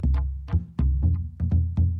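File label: Calm Far, music; From Dust; ps3; xbox 360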